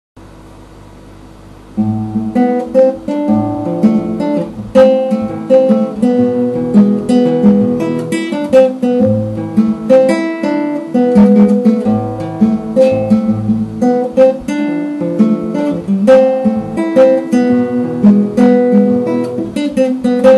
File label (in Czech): Tradicni hudba Kapverd